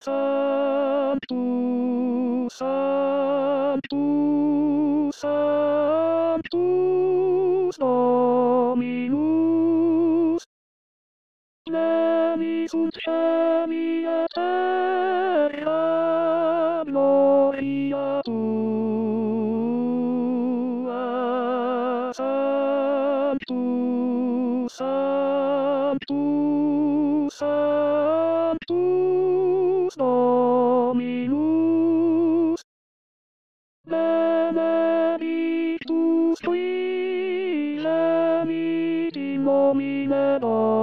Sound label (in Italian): Tenori; Sanctus Di Lourdes